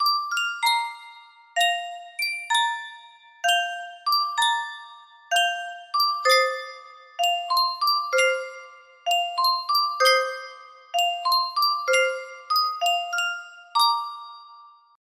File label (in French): Yunsheng boite a musique